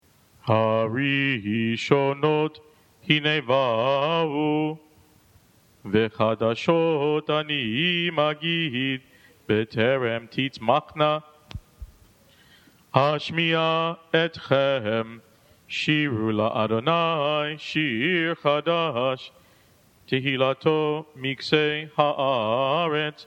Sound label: haftarah